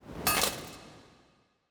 ambience room forge armor ready

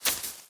environment zone metal thread hit

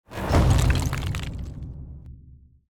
ui fast battle lose low layer

